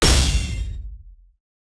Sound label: massive cinematic ba; special effects